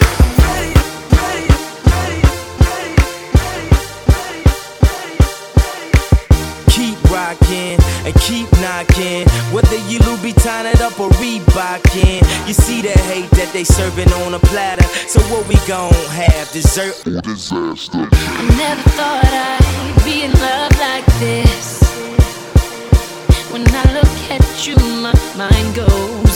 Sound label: Rnb